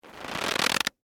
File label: Crunch Corn Starch, Bag; Texture; Crunch Sound Effects; Objects